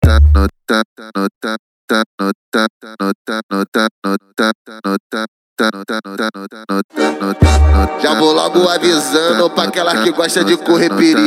dance e funk mega bass

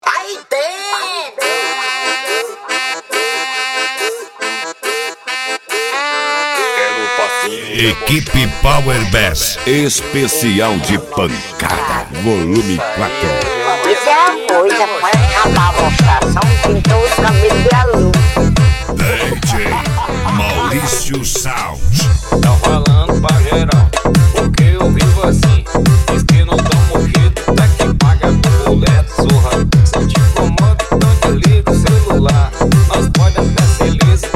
pancada; equipe power bass

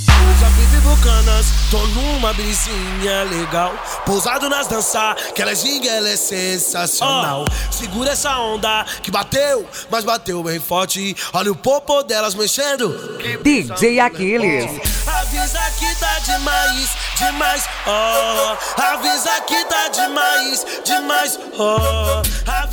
hip hop mega funk

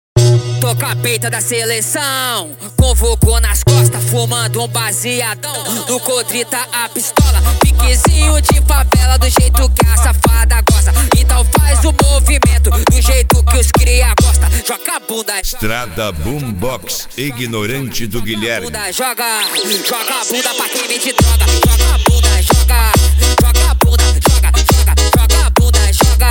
esp funk atack

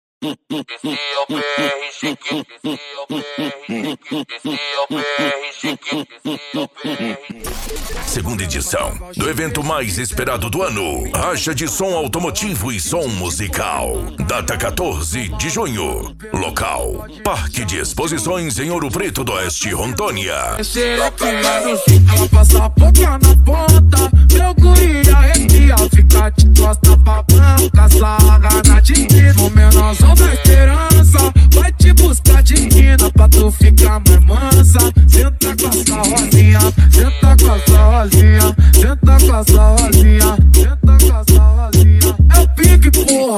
racha de som automotivo e som musical

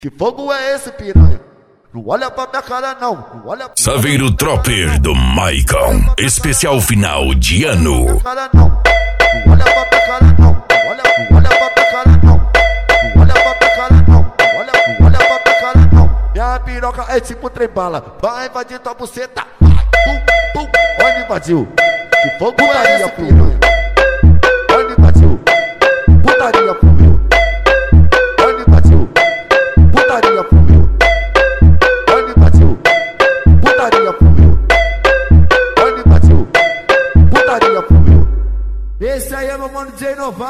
funk agressivo funk

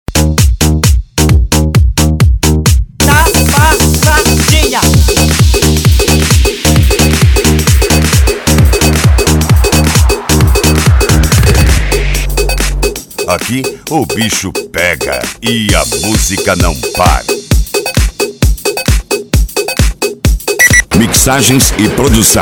eletro funk pancadao

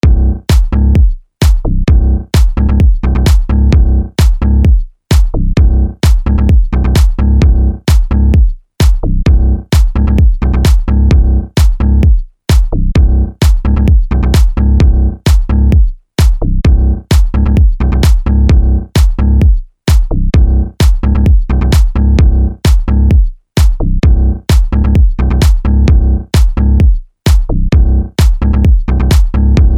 base mega funk bass beat grave tum dum dum; pack bases mega funk 2020

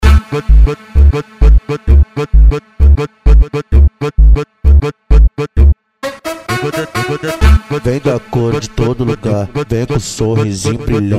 funk agressivo ritmado e funkadao

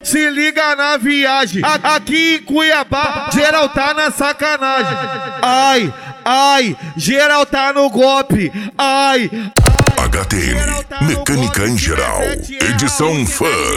mecanica em geral edicao funk

